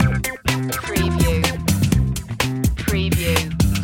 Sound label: crazy kids loop no guitar